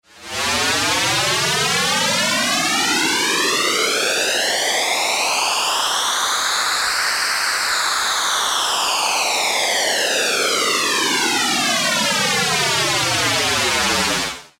normal noise

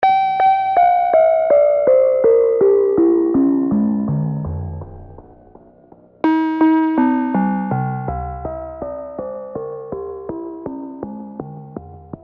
delay feedback

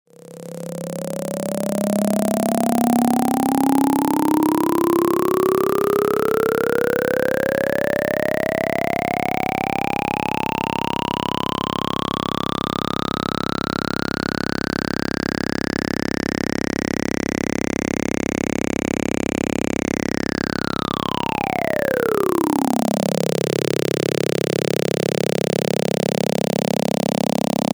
phase

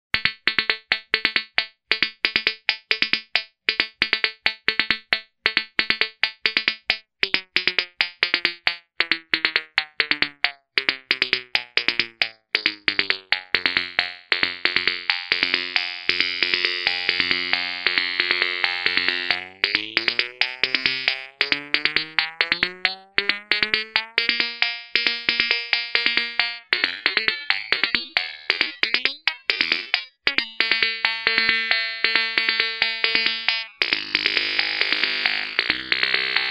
short delay; Digidelay

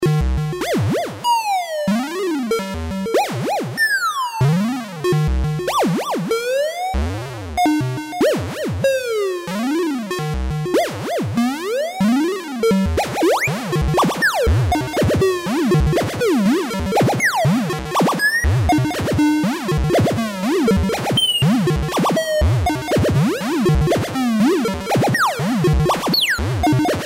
Rotate Sync; Quad lfo

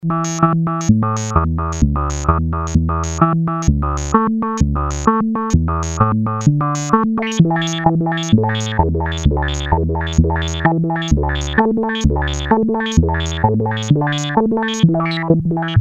stair on filter with reset, quad lfo

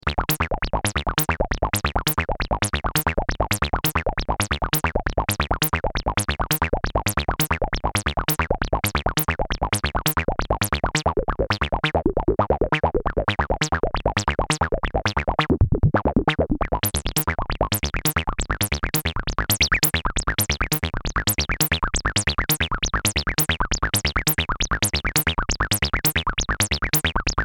18db wet, 48dB LPF

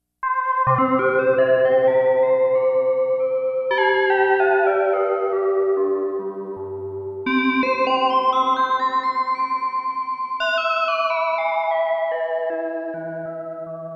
harmonics

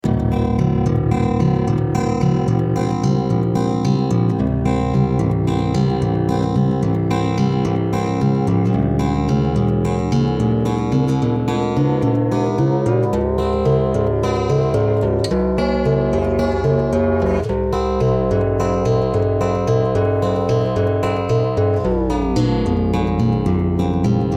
guitar 2ch; DualFS; mode1 guitar 2ch

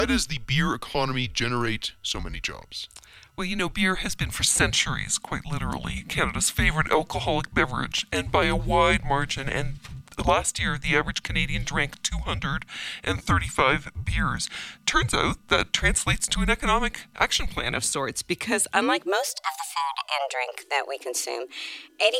voice, Euro Dual FS

